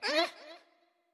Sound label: Wimp Hurt; Wimp Hurt New